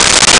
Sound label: Timbale; Nintendo; July 2020 Gigaleak